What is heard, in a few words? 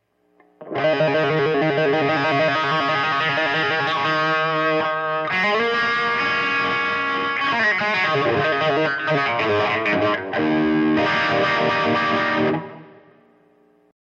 bluesy wah